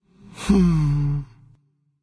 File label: sigh; smite; olorun